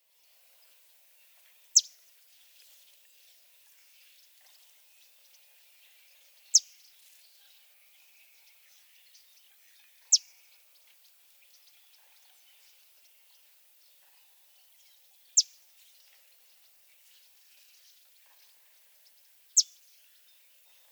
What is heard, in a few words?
Bay-breasted Warbler, Setophaga castanea